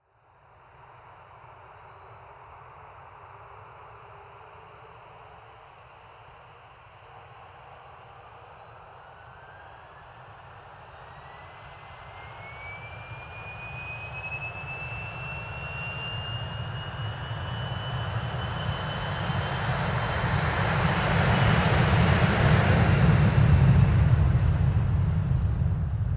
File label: Aircraft Lifting Off Whirring Sound